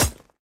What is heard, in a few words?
Weapon Spear